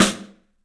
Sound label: STEEL S, drums; 3D Sound